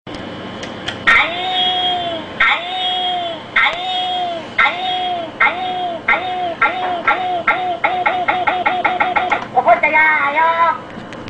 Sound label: japanese alarm sms